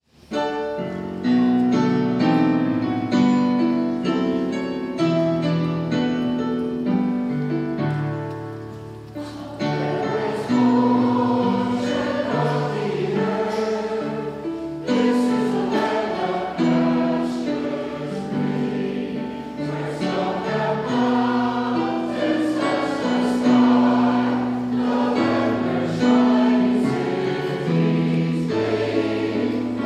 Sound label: Hymn to America (Chorus); 4th of July 2026